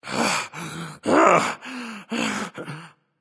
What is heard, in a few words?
breath, voicepack; smite; jorm